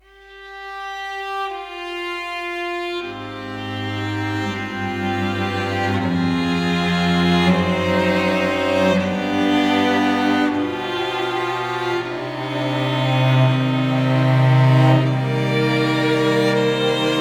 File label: Movement (Strings Only); kholat